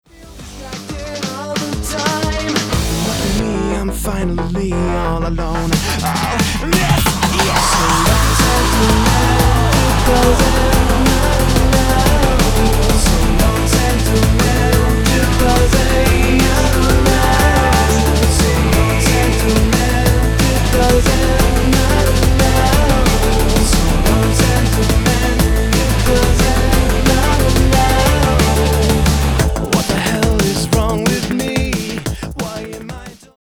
blown out guitar chorus

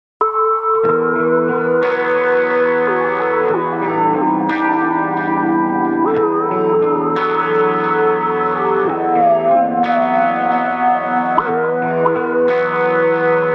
instrumental

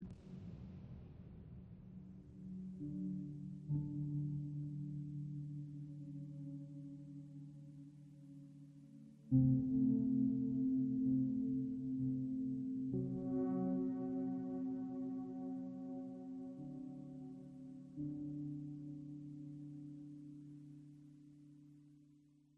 ambient

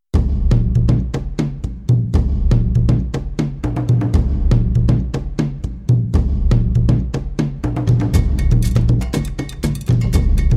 drum circle; venice beach

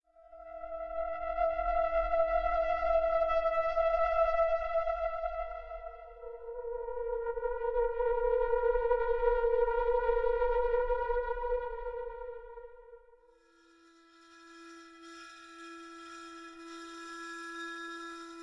store amb tv

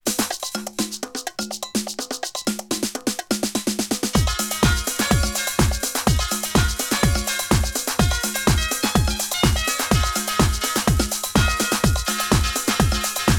tr 909 bad piano

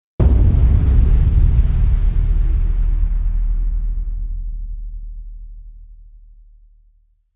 booms shatter

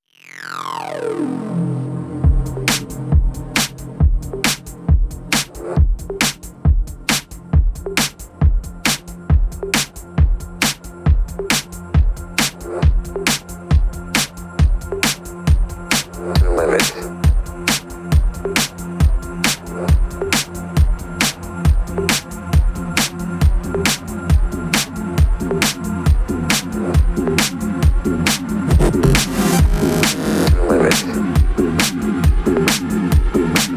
disco beat

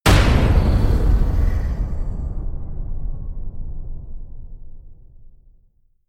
hit calamity; brand x music

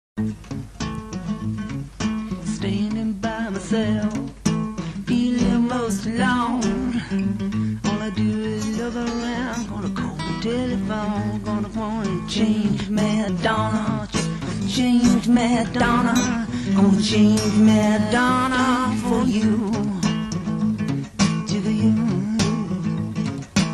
acoustic

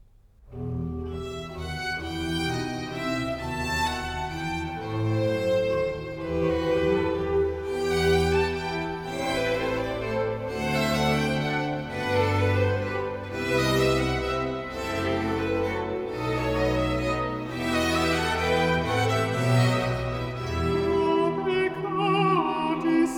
stabat mater for alto solo; antonio vivaldi; vi pro peccatis

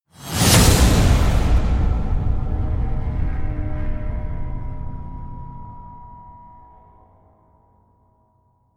evil slams with whoosh; executioner

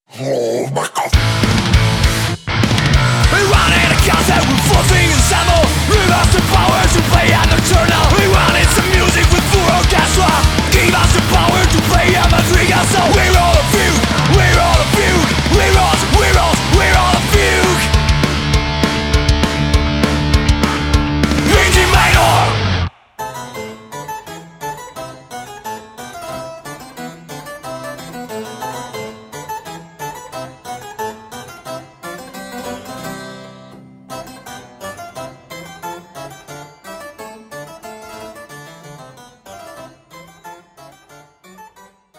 fugue in d minor

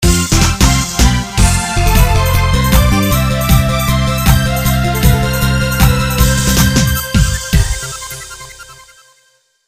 stage clear

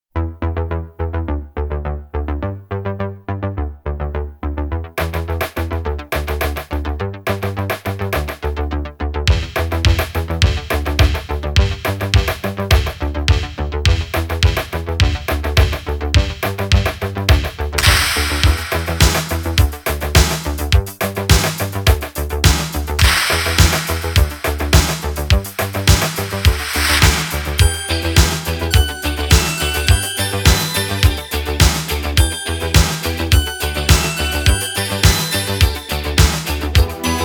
instrumental version

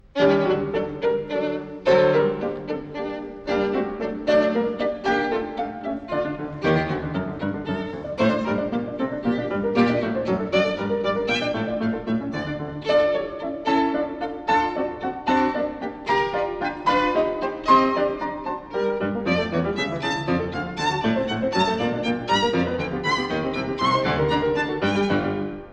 violin sonata in g major scherzo allegro; op 46 3

